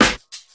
old school rich top end steel snare drum sample a sharp key; Duration 0:01; Mono